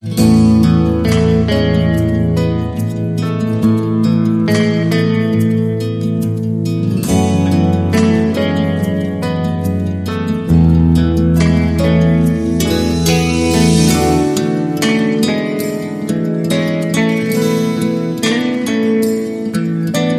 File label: Guitar Percussion Piano